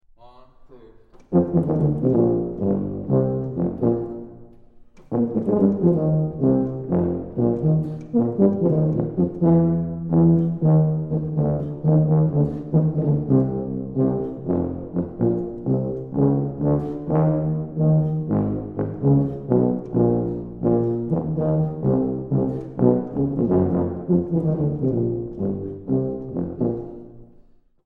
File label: tuba; out take